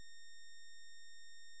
saved person

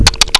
nt nt nt, Beeps; Bip Boing & autres

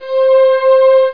Metal Pad; Prince of Persia 2 Demo

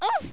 oooff; Worms

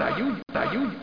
ahem

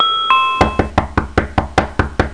at door; Acorn on the Moon; Langues

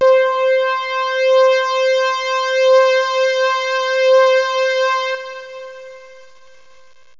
roland d50, synths; Zoom - Release 2 (1996); Active Software

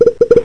ufo

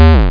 Error Beep; Grid Warrior

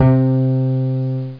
harp C2; Flashback

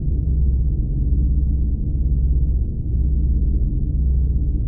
mystery main hall loop; Earth and Beyond; EA Games

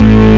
juno bass, synth

